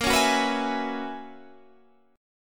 Gb 11th Bb

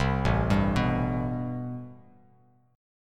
A-Minor 9th A Bass Standard; arpeggiated; JGuitar

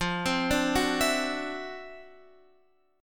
D 9th F down Guitar Standard; arpeggiated; JGuitar

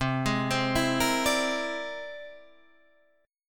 C Augmented 9th, Guitar, Open D

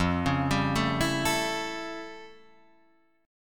F Augmented Major 9th, Guitar, Open D

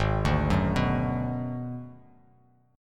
G sharp Minor 7th Flat 5th G sharp down; G#m7b5 arpeggiated; JGuitar

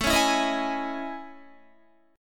A Major Sharp 11th, Guitar; AM#11 strummed

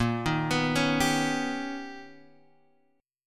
B Augmented Major 9th Bb, chord

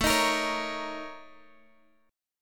A sharp Minor Major 7th Double Flat 5th, A

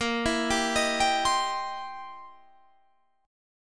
C 7th Sharp 9th, A sharp

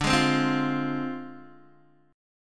D Minor 7th Flat 5th Guitar DADGAD

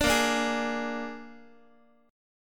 A sharp 7th A sharp, Guitar; A#7 strummed; JGuitar

